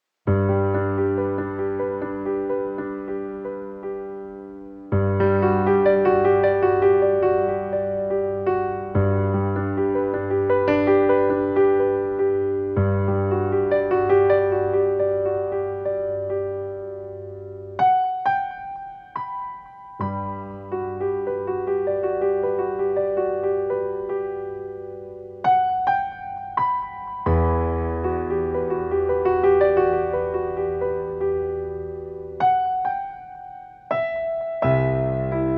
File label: PIANO